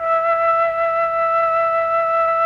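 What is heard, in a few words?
Mellotron flute; Sonic Reality; Vintage Timetraveler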